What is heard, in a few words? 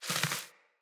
Sparrow Wings Quick Flurry; Animals